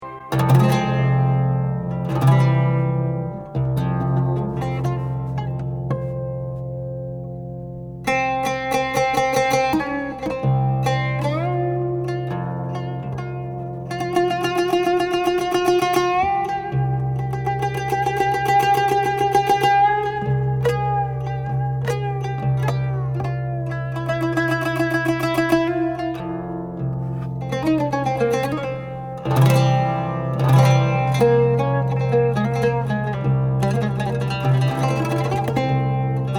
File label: Flamenco